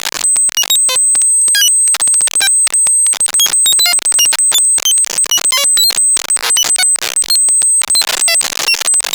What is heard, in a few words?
static wild, radio static; Zero-G Ambient 1